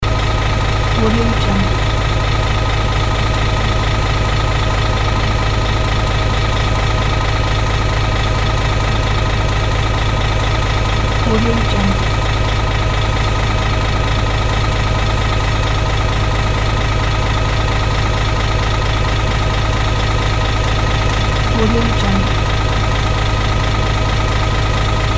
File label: bus engine; davidbeardmusicproduction